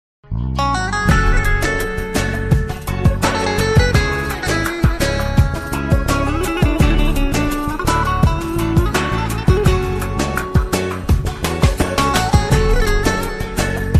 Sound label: great turky guitar; classical